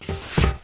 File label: metal roll; HereticII Demo; Player